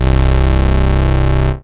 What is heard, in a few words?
Ratchet Zag Bass, analog; Ableton; Factory Packs; Synth Essentials